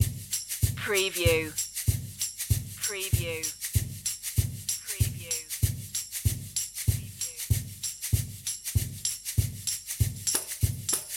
blues loop

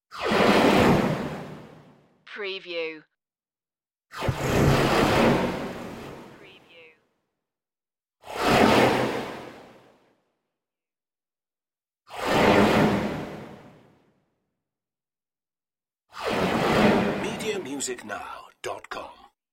starship wormhole beams

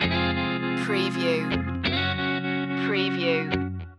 sunny day loop

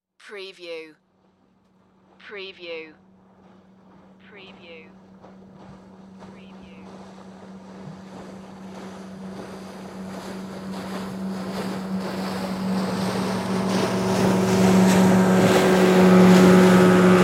Dive Raft Pass By

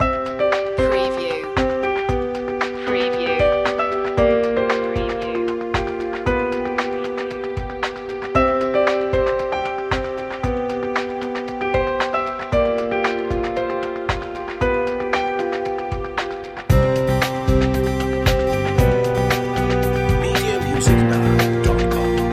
corporate achievement

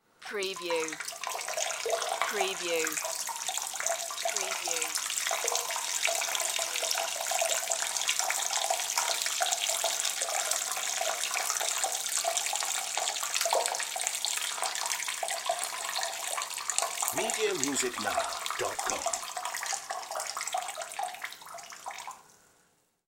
Pee, Male Urinating Into Toilet In Tiled Bathroom

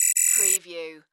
Beep Multiple Beeps; sound effects bible

